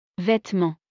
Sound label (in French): vetement